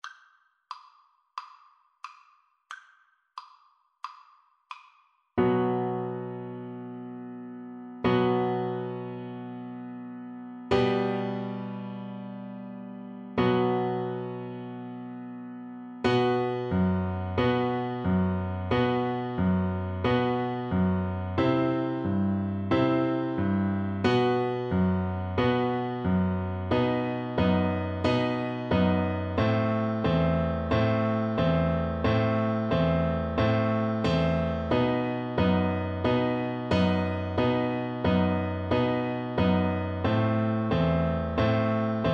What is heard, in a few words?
hava nagila, Bassoon